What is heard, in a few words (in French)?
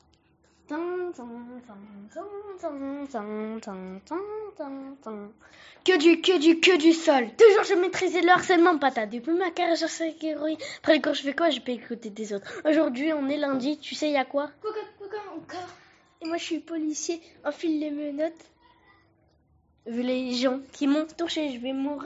RAP SLAM